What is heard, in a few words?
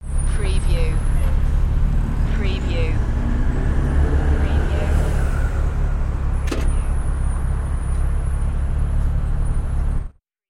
Bus Street